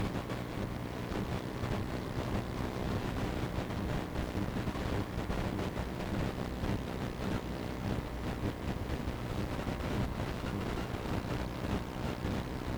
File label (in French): Bruit de fond appareils audio